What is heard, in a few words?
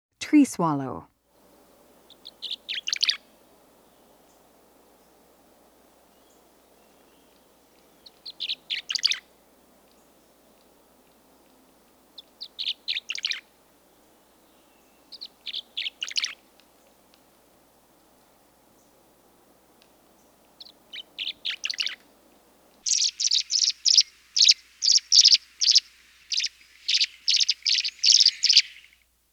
tree swallow